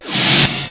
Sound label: wipe; SCALA